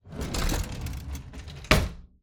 Drawer Kitchen Cutlery Drawer Open

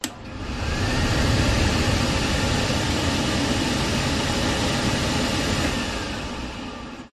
pub bathroom hand dryer; field reports

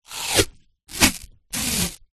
band aid plaster strip peel from roll

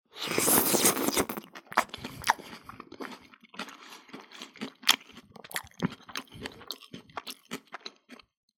human eating stir fry close up; zapsplat

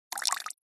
nature water short sudden drips

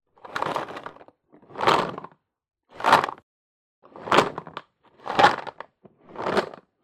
toy puzzle peices tip in box various

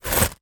foley coins inside small backpack movement

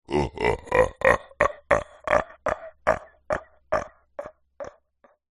horror male low pitched evil laughter

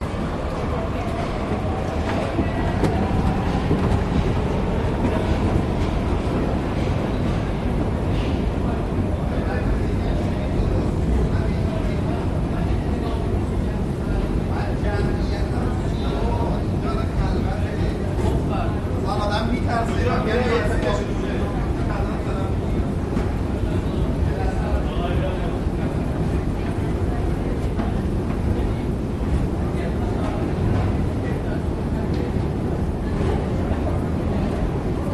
Metro Ride People Chatting Man Coughing; Budapest Hungary

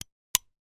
gun 1911; weapon; still north media

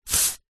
household aerosol insect spray single short burst; zapsplat

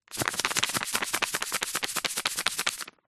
household medicine laxative powder sachet shake

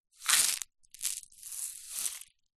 salt rock in plastic shaker tip movement; zapsplat

